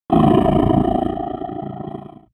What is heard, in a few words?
wolf angry